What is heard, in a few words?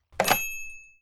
memory click; Boss Baby - Matching Pairs